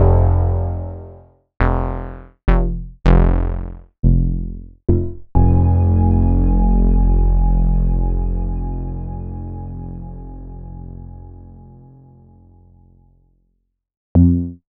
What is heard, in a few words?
Oberheim OB-Xa Bass